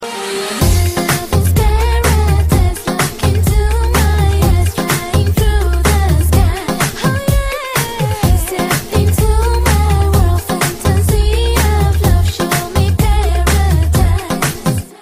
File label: Oriental Feel